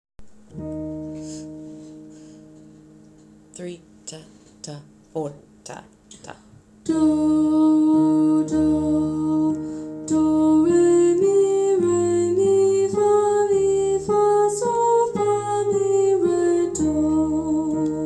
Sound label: Bee ah Solfege